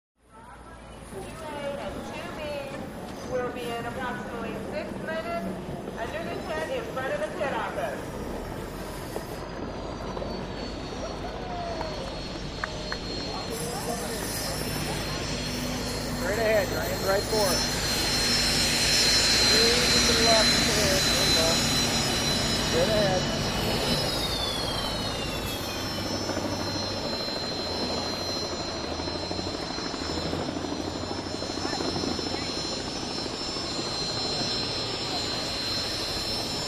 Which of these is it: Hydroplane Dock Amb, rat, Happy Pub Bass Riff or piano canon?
Hydroplane Dock Amb